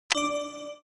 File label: Click Total Win Collect